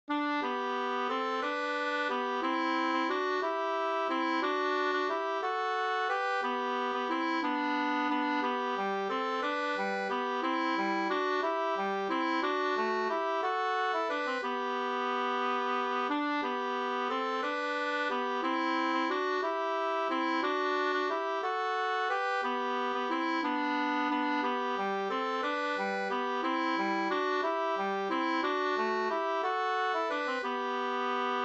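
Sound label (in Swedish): vals Brudvals, two-part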